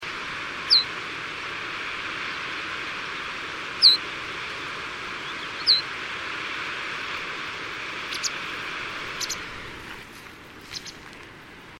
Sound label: Motalb, Motacilla personata; Linnut; Tamga